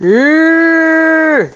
iiiiihhhh

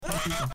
AHHH

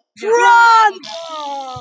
fart

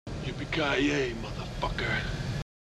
yippee

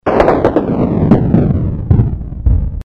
fail; osu

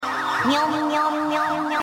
meownmeown

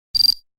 Card Accept; Among Us

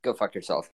yell

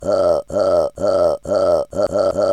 remix burp

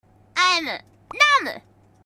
polka

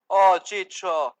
ohhh

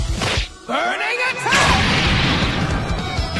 burning attack

